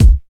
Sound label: bassy bass drum one shot c sharp key